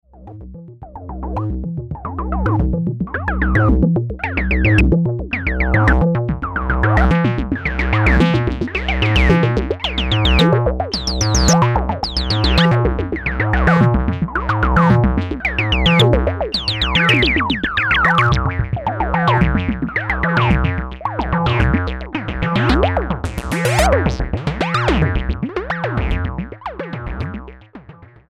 Chaotic Sequence

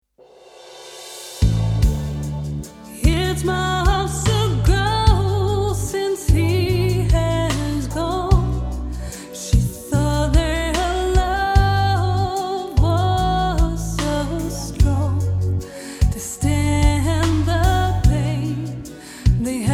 Reverb UAD EMT 240

